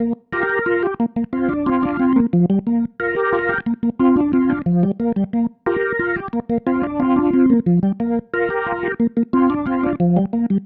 Pattern, Organ

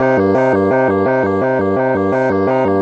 synth arp; Electrocution

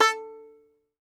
Tenor Banjo, Plucked Strings

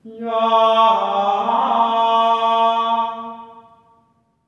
GR ALLELU, Symphony of Voices; Spectrasonics

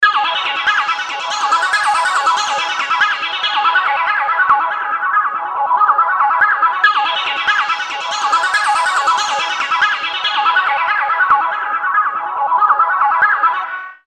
synths, industrial

